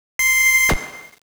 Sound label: pager; svencoop